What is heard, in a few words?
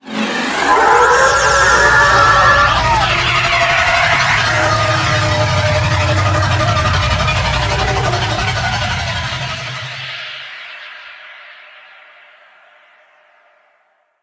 Drop Off B; Vehicles; Flight; Warframe; WM Liset